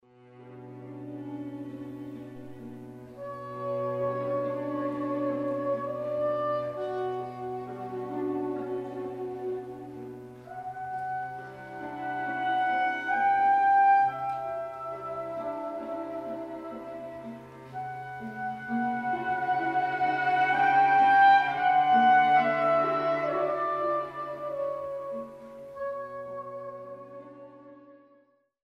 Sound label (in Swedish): Jazzsvit